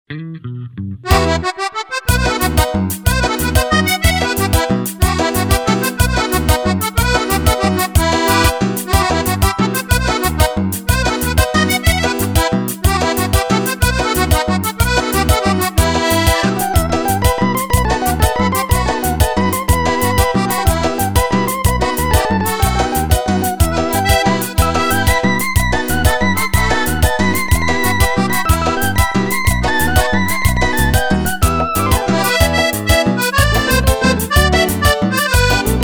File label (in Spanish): Instrumental Chamamé